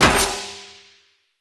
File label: Battle Dog Helmet Destroy; Snc Battle Dog; Foley